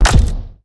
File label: imp heavy shield